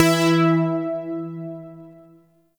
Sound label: Shawzin Oct Two E; Music; Warframe; Zariman